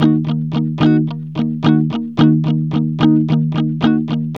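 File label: ROCK D minor 110, Vintage Blues Guitar; USB Soundscan vol.22; AKAI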